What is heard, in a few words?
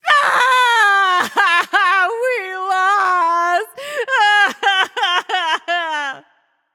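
boohoo, female voice; smite; voicepack; hera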